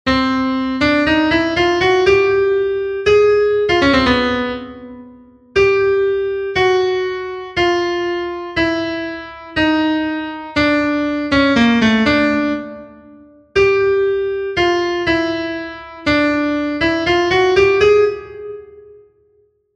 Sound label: Canon ground C minor; bach torus